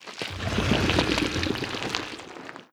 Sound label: idle subtle, barnacle; Half Life Alyx